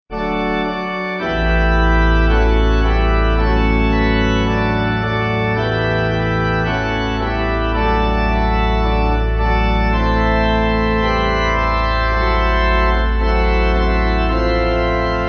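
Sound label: Pipe Organ, Music Backing Files for Churches; Gloria In Excelsis; Oldroyd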